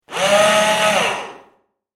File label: Electric Screwdriver; Yard Tool Sound Effects